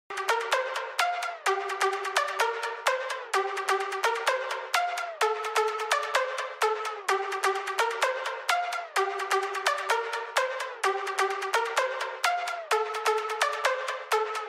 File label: tornado instrumental